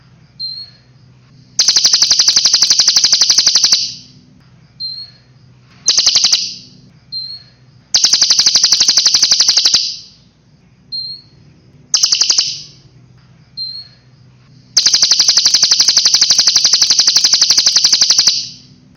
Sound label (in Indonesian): kolibri ninja